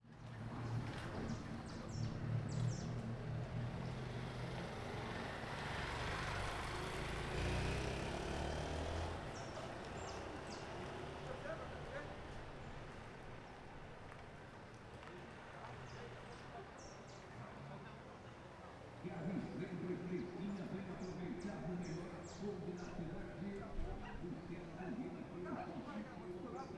Ambiente ruas de São Domingos de manha com carro de som longe; Colecao Sonora do Cerrado

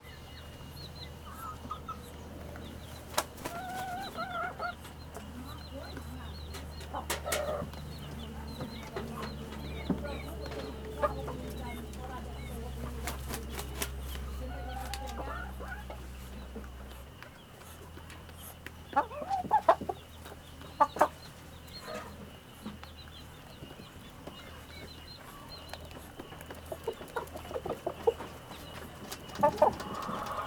Galinheiro perto de estrada galinhas pintinhos vozerio; aves; Coleção Sonora do Cerrado

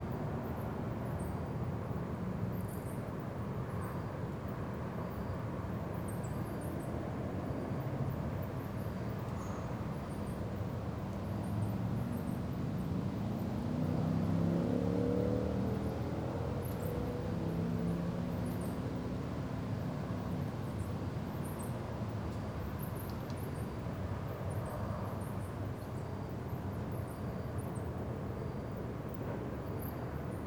Ambiencia residencial goiania noite moto passando com morcego, grilo e; longe; ambiencia urbana; aviao

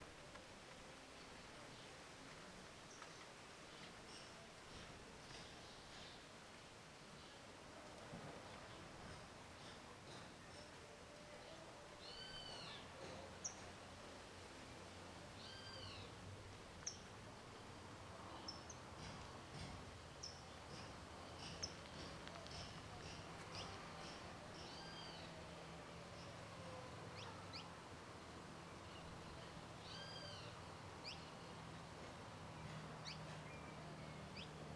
Ambiente em rua residencial de vila; ambiencia domestica

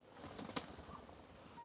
Revoada de uma Unica Rolinha-Carijo ou Fogo Apagou; aves

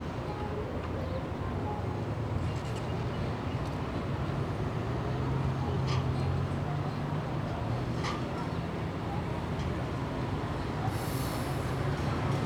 Muito transito longe alto do predio goiania de manha carrinho de compras; musica

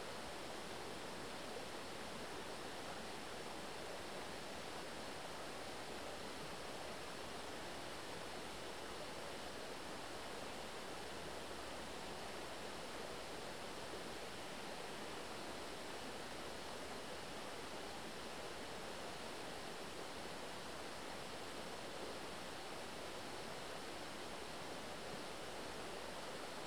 Beira do rio vermelho ruidoso com grilo constante; agua

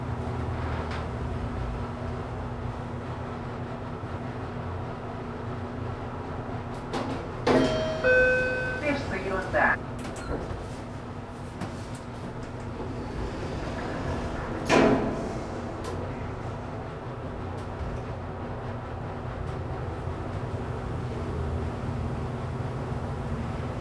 Interior de elevador parando em andares ventuinha sinal sonoro; ambiente interno

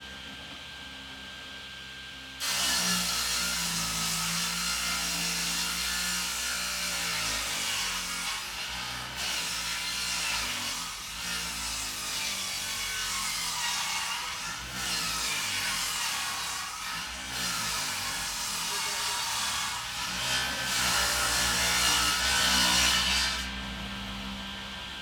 Serra com pequenas pausas em ambiente interno com pessoas longe; maquinas

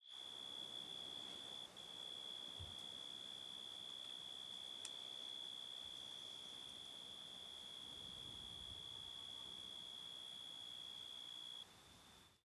Grilo com som Metalico Semelhante a um Apito em Mata Fechada; Cerrado; insetos; colecao sonora do cerrado

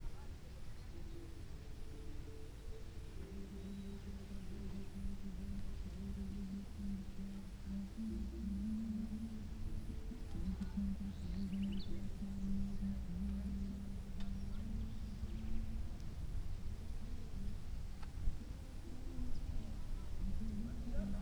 Ambiencia ruinas com vento fraco, grilo, pessoas longe e musica

